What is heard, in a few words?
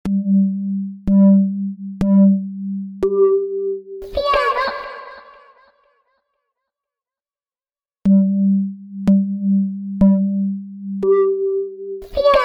piano